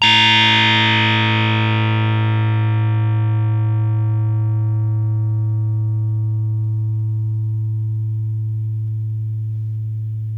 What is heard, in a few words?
rez rhodes